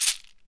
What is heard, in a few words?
SHEKE, Afro Shakers, Shekeres